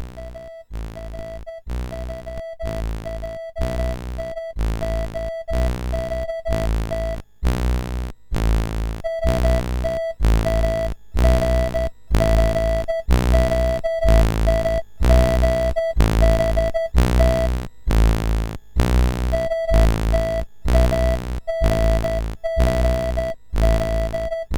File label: Starflight, MOS6581R4